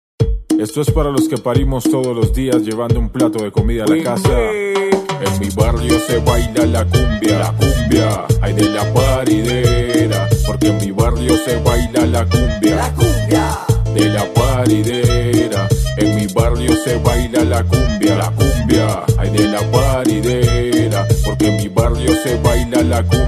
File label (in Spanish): Cumbia